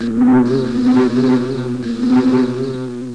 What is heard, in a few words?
Termite Buzz; Let's Discover Massachusetts